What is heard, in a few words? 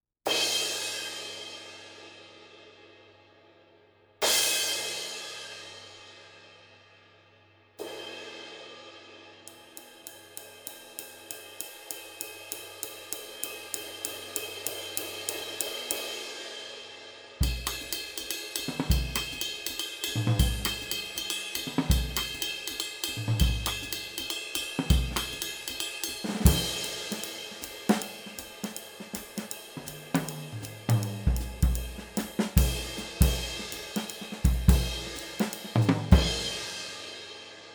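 Sabian Proto 22 HH, cymbal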